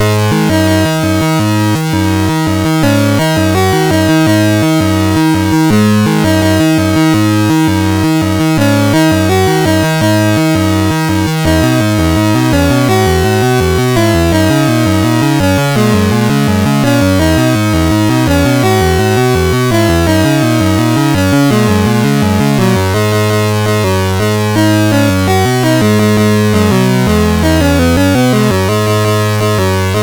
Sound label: Sunburst, CSG8580R5; hvsc